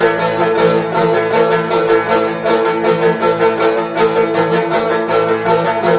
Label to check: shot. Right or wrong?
Wrong